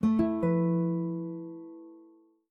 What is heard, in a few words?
Guitar, notifications; Samsung Galaxy Z Fold8 Ultra; Sound Theme; Calm